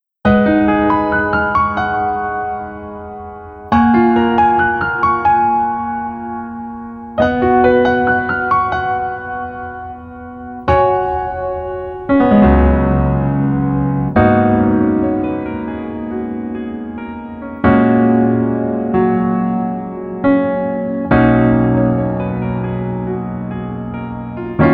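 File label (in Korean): Piano version 멜로디 MR -1키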